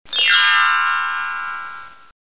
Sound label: Door open; How Many Bugs in a Box